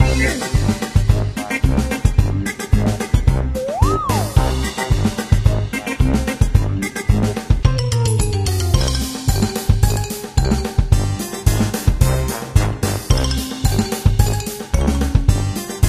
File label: Arcade Looped